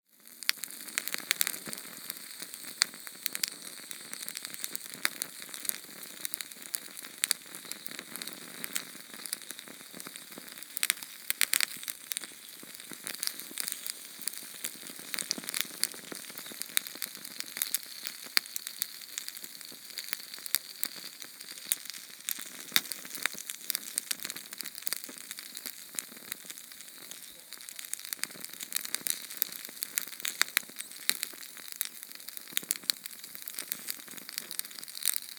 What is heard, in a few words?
Sterno Flame Small; MinorHallutinationsSounds; SFX Producer